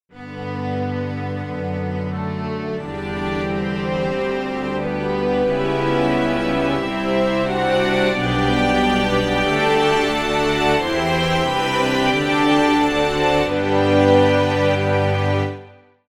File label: Chamber Strings